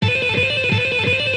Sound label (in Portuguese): Estudo de ligados 170bpm; guitarbattle